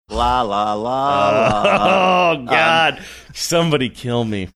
singing la la la la; saying oh god somebody kill me

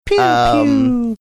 making ray gun like air horn sounds

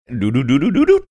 singing series of ascending notes

